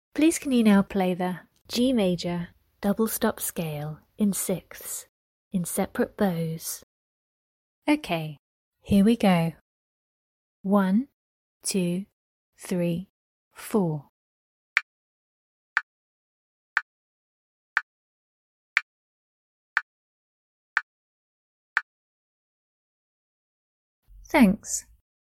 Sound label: g maj double stop scale 6ths sep bows